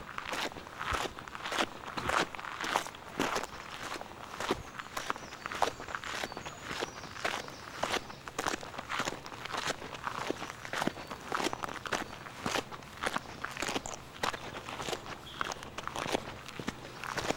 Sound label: Saltwells Nature Reserve Walking On Gravel